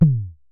Synare Filter; Star Instruments Synare 3

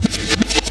loop, 170 BPM, drum & bass

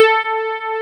DIDI, SYNTHESIZER, DIGI WAVE

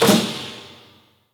A#3, JAPANI PERC; Northstar; The Wizard VOL-1

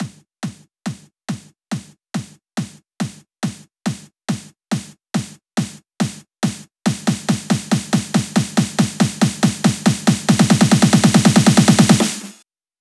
Fills, Loops 140 BPM

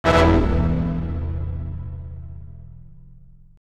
Horn Stab

